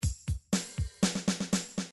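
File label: midi drums heavy rock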